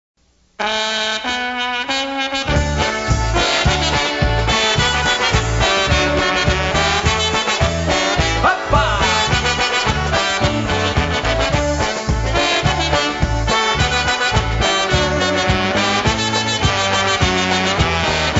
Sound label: MINI-SKIRT POLKA